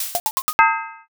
transform mech

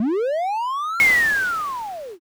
beam cannon

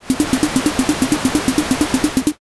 carriage

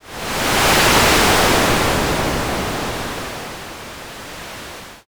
wind

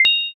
notify like

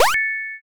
surprise

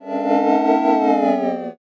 chatter

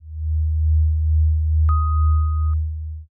final tension

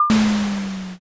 auto door

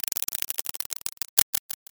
roulette

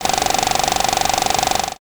projector

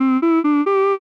voice hum

